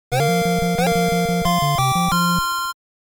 Start Signal

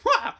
rage

